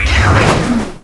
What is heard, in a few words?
charge